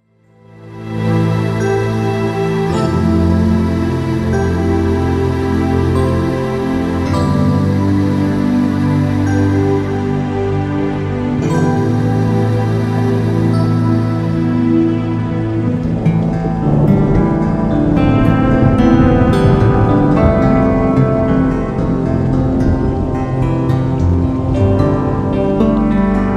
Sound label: SUMMER RAINSTORM